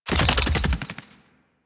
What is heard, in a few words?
Infested Big Ashen Melee Start; Warframe